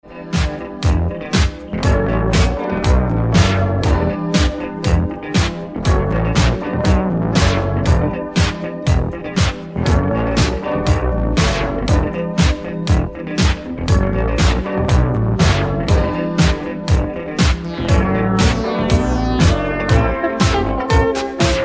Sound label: instrumental